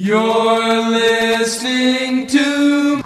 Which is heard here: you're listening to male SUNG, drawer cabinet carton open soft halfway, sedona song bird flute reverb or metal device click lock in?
you're listening to male SUNG